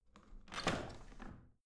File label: washing machine drawer out squeaky